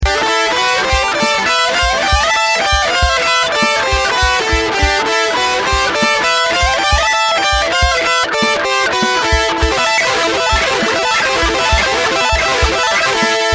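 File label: Harmonie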